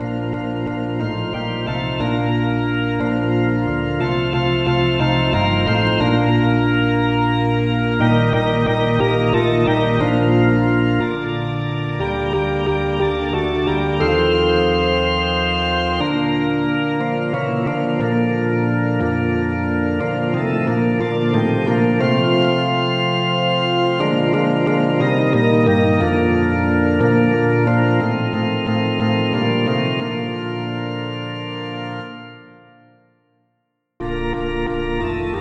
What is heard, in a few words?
polyinstru; hymns; Himnario grande